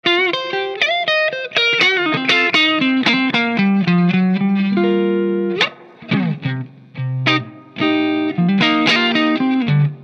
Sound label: Marshall Vintage Modern Clean